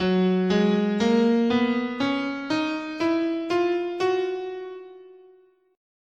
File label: Gb Bebop Dominant, scale